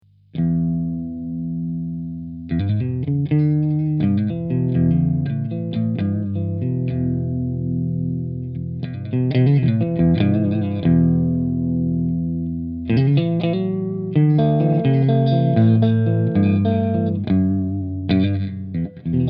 badtrain clean

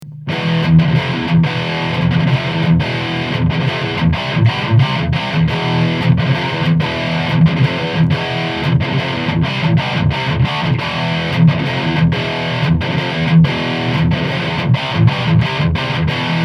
Eminence V12 Lead

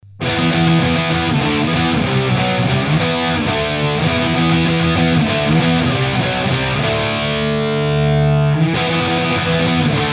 Egnater M4 XTC, PRS Custom 22

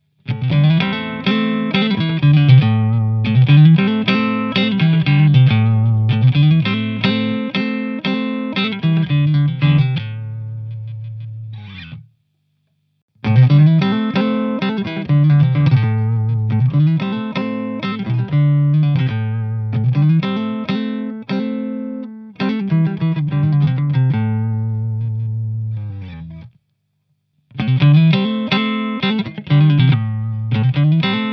Belden 9778 vs Sommer XXL

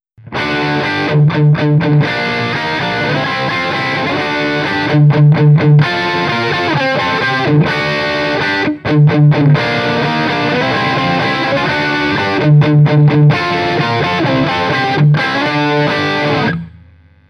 Charvel VH II

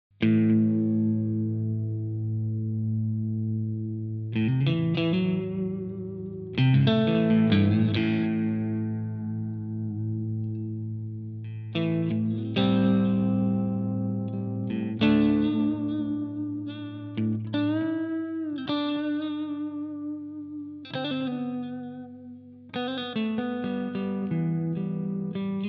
Punchy Clean

